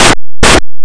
TIMER

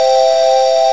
blow, major

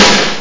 snare; Groovy Bytes: Behind the Moon; BAS PERC

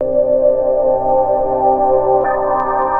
SYNTH D+B 160 C, Drum+Bass, Keys; eJay

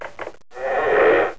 DOOR OP

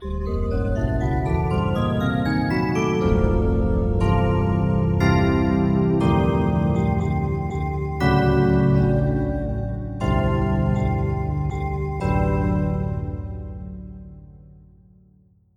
Digi Synth Octs

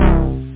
tune; da capo vol1; instruments; synth; chords

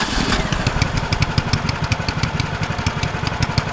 Jeep Start engine; Play&LearnRC; 02Jeep

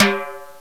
Timbale H; Drums; TG300B; GMPlay

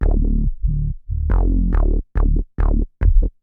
bass B; Techno Music Maker

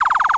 laser gun, electronic sounds; Sound Effects for Multimedia - Volume 1

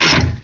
gear

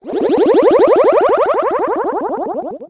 jump; darwinia

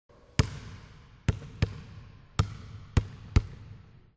dribble; WMBonusXP

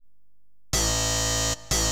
synth house 125 A; eJay; Keys

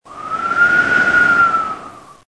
fan; Arcade Lines

